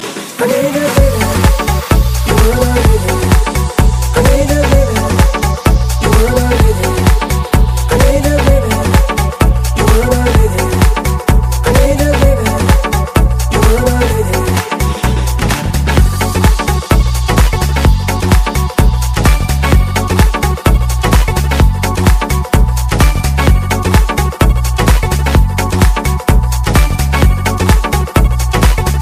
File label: House Mix